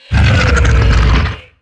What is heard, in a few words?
amazon statue die; DAoC